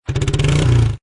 Quad Bike Rev; Quad Bike. Motorbike, motorcycle type Quadbike. Rev engine.

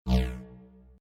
Digital Chime Lazy Push; Part of the LYN094 package of Digital Clicks, Blips & Alerts; Digital Sound Effects

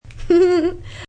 Lady Giggle, A lady giggles; Laughter Sound Effects; Voice

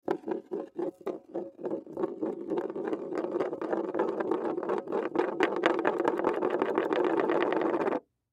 Jar Metal Lid Spin Wood Surface Glass; Food; Household; Blastwave; Foley

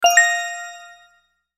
Chime Bell Strings Bright; Production Element Imaging Element Accent Transition; Multimedia; Chimes; Blastwave

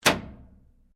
SWITCH LIGHT ON, Sounds onboard HMAS Castlemaine; Boats; Vehicles

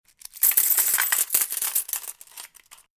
Coin Rattle; Coin rummaging, coin movements. Can also be general small metal movements, like a key in a lock.; Money Sound Effects; Lynne Music

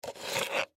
Plastic Cup Pick Up; foley; Objects; Blastwave

